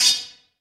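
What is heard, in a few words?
Cricket Oneshot, beats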